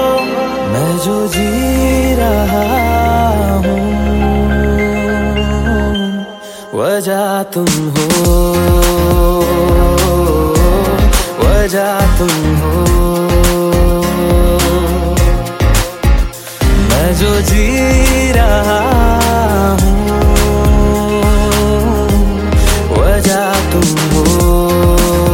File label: Romantic Ringtone